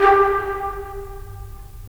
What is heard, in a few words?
BRS, Tps Stacc; Roland - LA Composer vol. 4